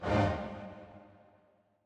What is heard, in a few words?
transition braam